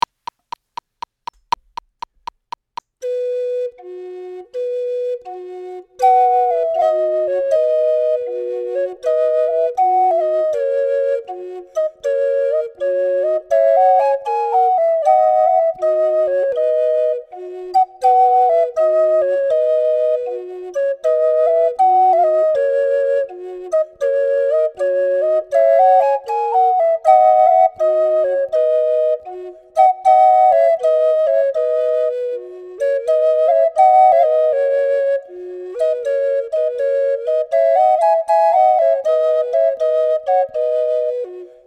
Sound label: Italian Piper